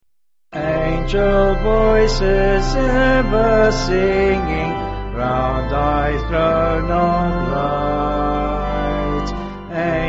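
Angel Voices Ever Singing, Piano, Band, Pipe Organ; 5/C Vocals and Organ; Sung Lyrics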